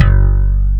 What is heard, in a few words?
BASS, MIDI BASS; East West - Global Sounds